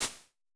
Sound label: Shakers, Vermona Mono15 Drums